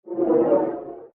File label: Subtle Aircraft Flyby Whoosh